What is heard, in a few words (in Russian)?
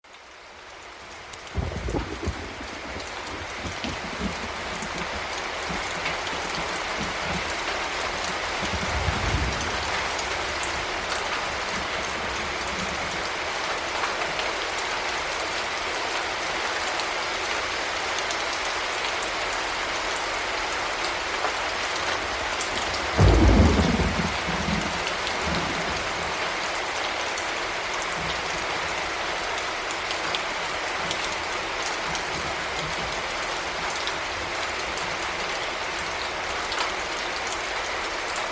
zvuki prirody vesna legkii dozhd; vesennii sbornik